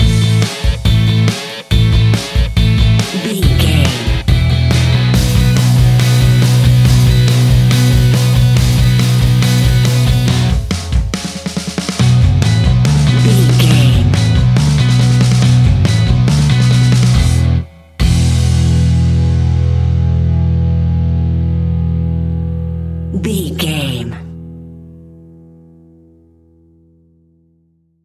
pop rock; Surfing The Pop Rock Skies 15 Sec Mix; Catch 22 Music Network

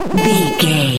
retro alarm siren loop

